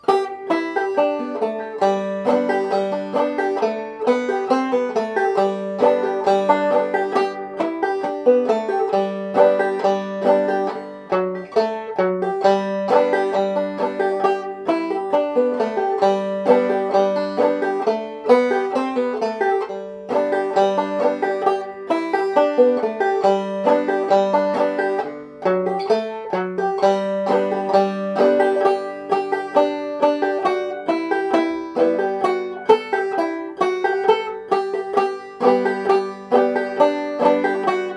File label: Banjo; Mary Wants A Lover